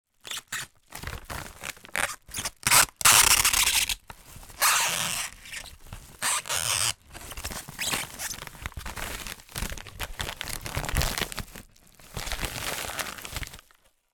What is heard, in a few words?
office envelope padded movement squeaks as if strugling to fit item in